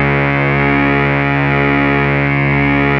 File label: 2600 D#3, ARP 2600; Hollywood Edge - Giorgio Moroder Rare Synthesizer Collection